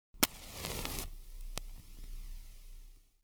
spacefire

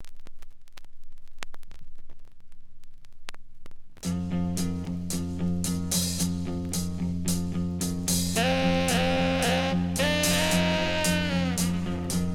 Choo Choo Train

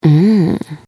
mmm

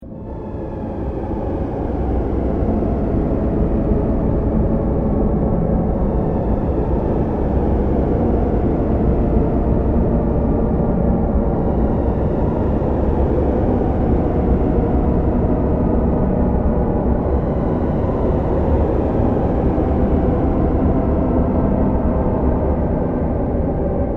space

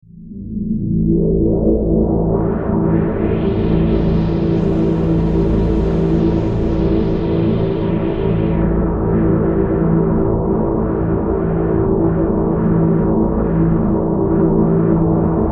space

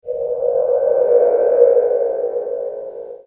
windmachine

